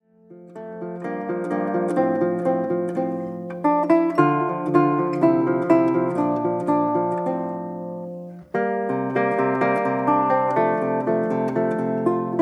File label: Andantino, guitars; 1785 G. B. Fabricatore; Carulli